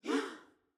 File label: audience gasp